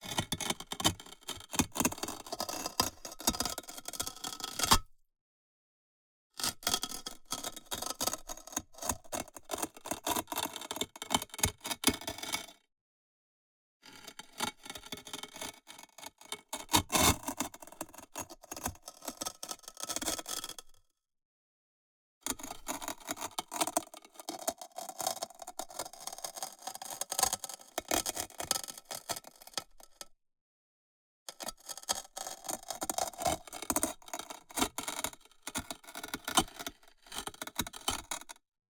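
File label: Ceramic Break Ceramic Roof Tile Cracking; Justsoundeffects; Stones and Debris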